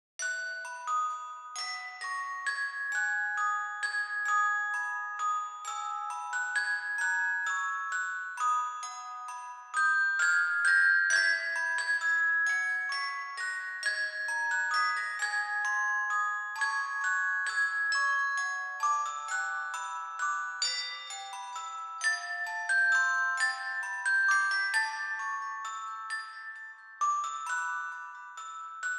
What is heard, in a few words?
on orgel; Atelier Totori The Adventurer of Arland Alanya of Music; Pilgrimage